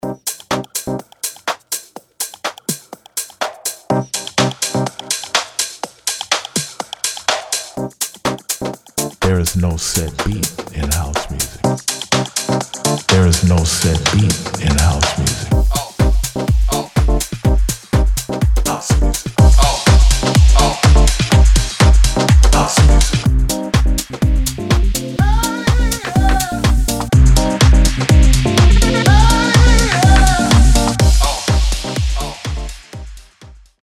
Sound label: Piano House